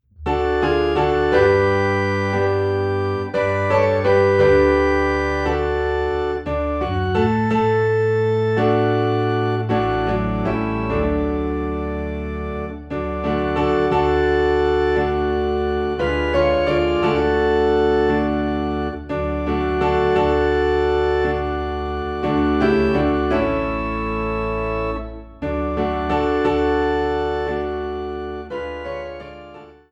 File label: Piano and Organ in D